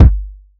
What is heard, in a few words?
reverb kick g key